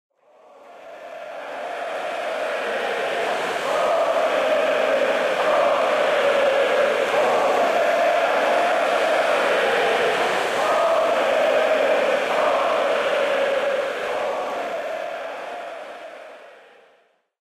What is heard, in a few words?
crowd Chant Right, football